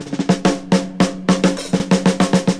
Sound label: Drum loops, Rolls